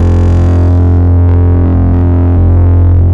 BASS, C2; Zero-G; Chemical Beats; BASSES

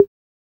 Mid Tom; Roland MV8800; CR-7800

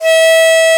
DIZI E4, Winds; E-MU Producer Series Vol. 7 – Old World Instruments

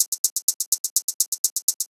Hats 125, Perc Loops, Old Skool House

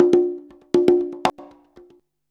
CONGA 119, Modern Percussion Loops; USB Soundscan vol.56 - Modern Percussion Loops; AKAI

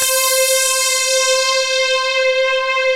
SYN, SYNTHS; Pro-Rec - Synthsonic

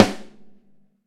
Ludwig Snare; EMU PX-7

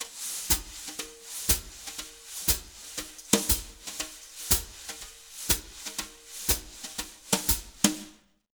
Jazz Latin Drumloops; USB Soundscan vol.08; AKAI

